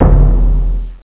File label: TAIKO 36; Dark Forces Demo; Dark Forces Instruments